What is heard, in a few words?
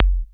broken pip kick, electro FX